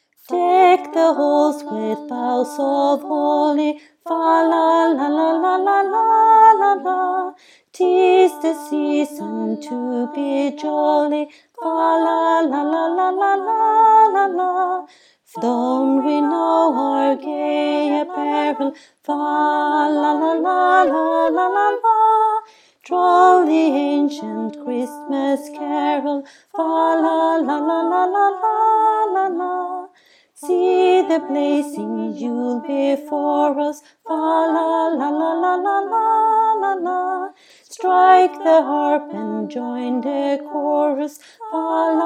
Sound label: Alto; Deck the halls